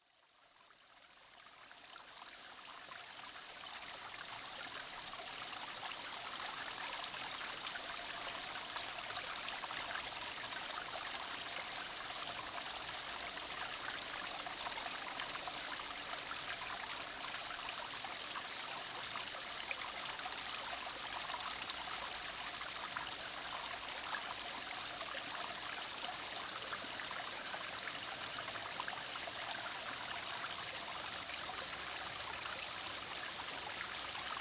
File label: Brook